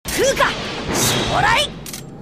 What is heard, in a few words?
incantation card wind